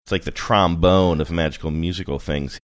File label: its like trombone of magical musical thing